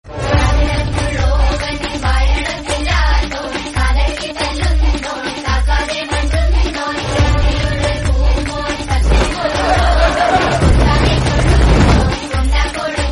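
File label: song, malayalam; thallumaala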